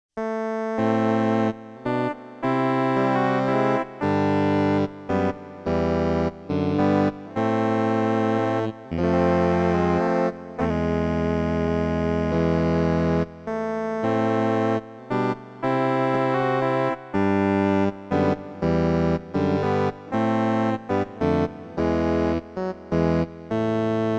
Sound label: Casio Guitar